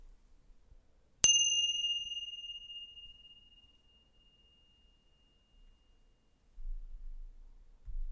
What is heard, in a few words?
Chimes